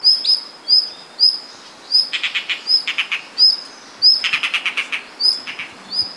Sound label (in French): monticole merle bleu, orn